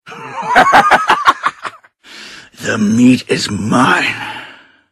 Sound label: cannibal idle; frank